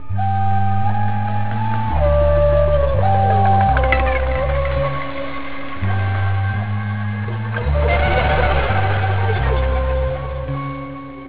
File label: Bass Echo 8000Hz; JunkArts; Okolo Okna Bass 7